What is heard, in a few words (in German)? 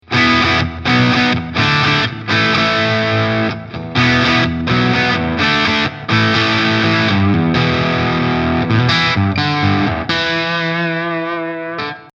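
PRS Steg coil split zerre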